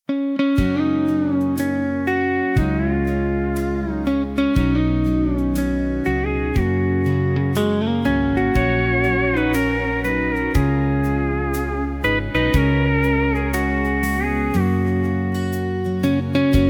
Happy Birthday Pedal Steel Guitar Soft instrumental Version